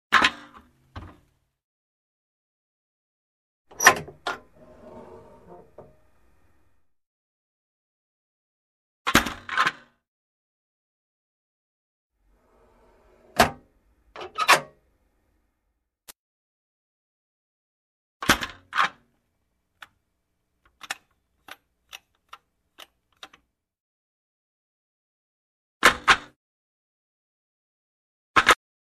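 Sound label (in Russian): звуки сейфа открытие закрытие.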